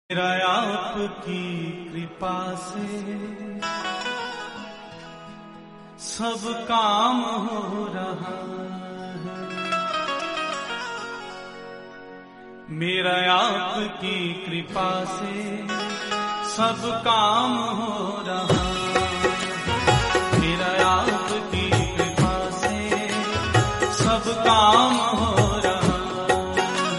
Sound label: remix hindi